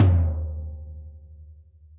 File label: Tom Floor Direct Natural; Drum Hits; Standard Hits; Ableton; Factory Packs; Session Drums Studio